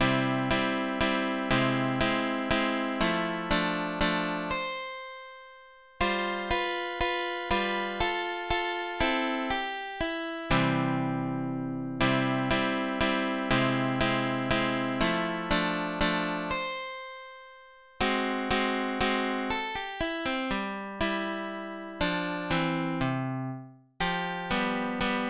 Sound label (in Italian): minuetto, guitar; Giovanni Navone; 4 composizioni